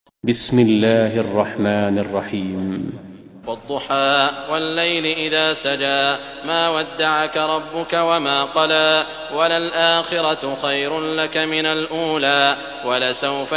Duha, kuran; sudeysi sureler

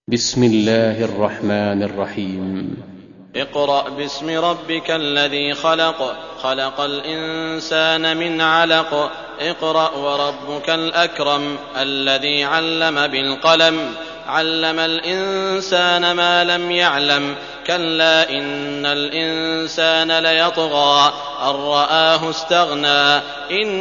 Alak, kuran; sudeysi sureler

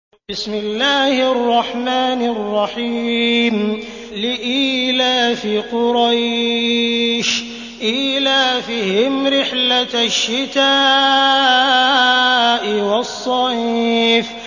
Kureys, kuran; sudeysi sureler

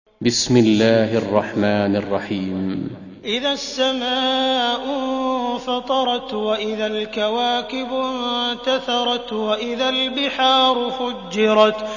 infitar, kuran; sudeysi sureler

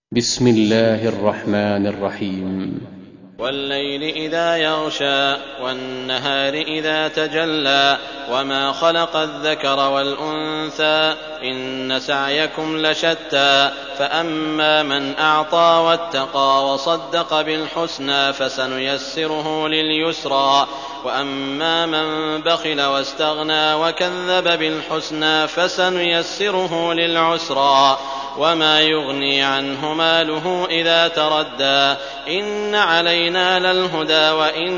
Leyl, kuran; sudeysi sureler

